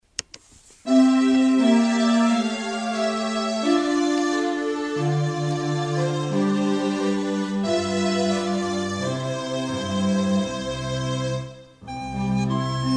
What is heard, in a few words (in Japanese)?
オーラリー①（メロディ①, 音楽リコーダー; ５年生; 成隣小学校 WEB音楽室